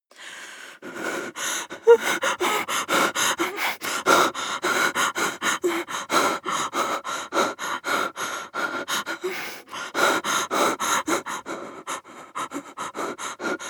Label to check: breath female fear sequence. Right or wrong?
Right